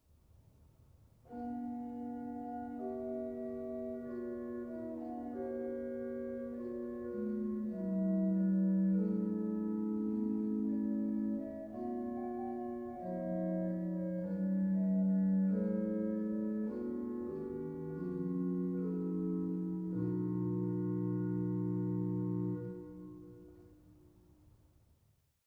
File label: Gedackt; dortmund; Sauer